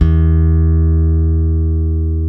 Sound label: PRECISION BS, Bass, F 3; Spectrasonics; Bass Legends